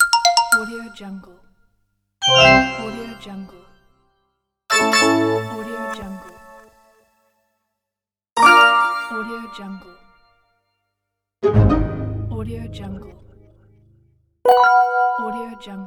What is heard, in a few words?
user interface menu pack